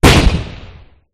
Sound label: explosion